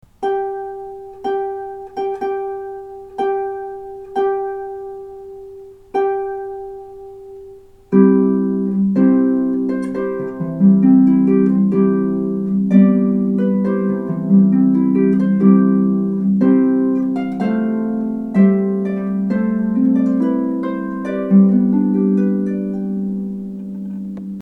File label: harpist